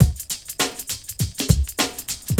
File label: SHUFF 100, loop; 100-114 BPM